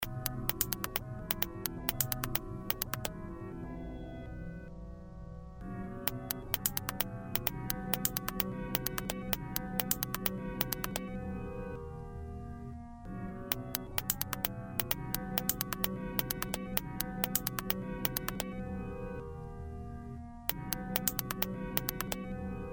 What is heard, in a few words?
instrumental; 2006 glitch instrumentals